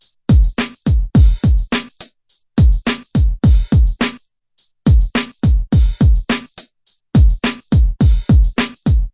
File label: beat; REMIXABLE COLLECTIONS